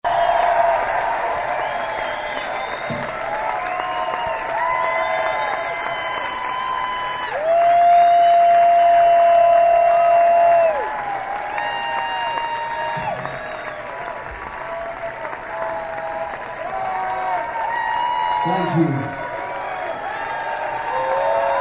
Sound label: audience